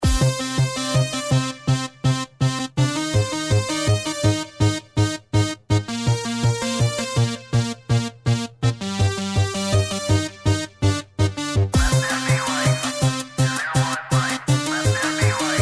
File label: ENERGETIC AQUALOOP REMIX